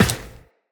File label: Run Glass, Footsteps; Tenno